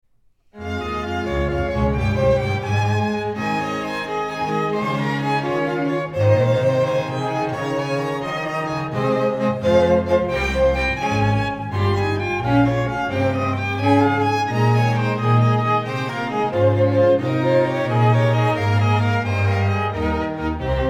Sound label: gigue